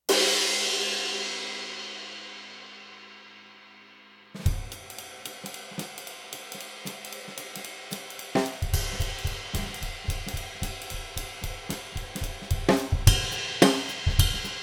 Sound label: istanbul k zildjian